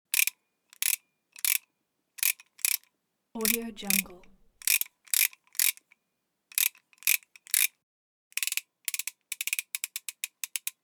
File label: ratchet wrench